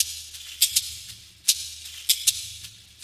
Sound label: Shaker, Percussion Loops; Ambient Grooves